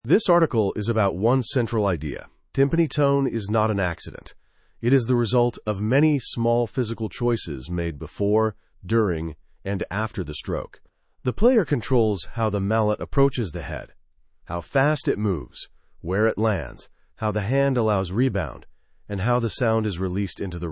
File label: timpani tone guided companion track, timpani stroke and tone